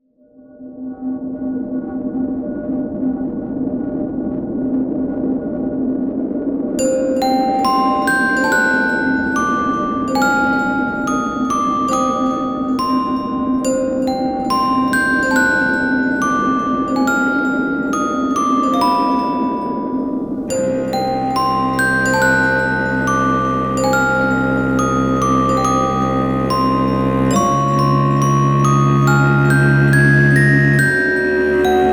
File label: Graveside Lullaby; Mary Skelter Finale